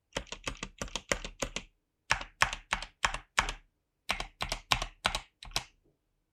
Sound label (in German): Leer Enter Zurueck